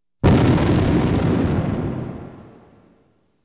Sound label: Science Fiction effects, Lasers, Light beams